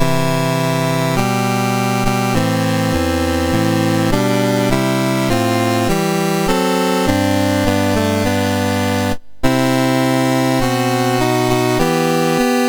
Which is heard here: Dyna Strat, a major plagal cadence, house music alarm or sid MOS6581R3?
sid MOS6581R3